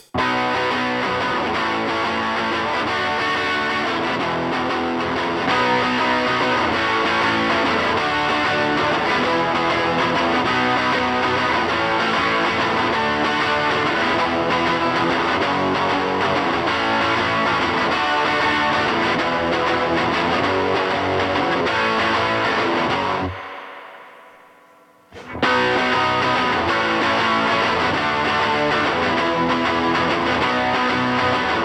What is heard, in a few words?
guitar